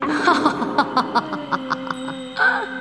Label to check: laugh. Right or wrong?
Right